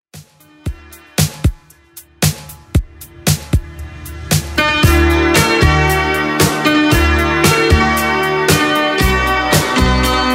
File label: 80s Redrum 115bpm; DJ Friendly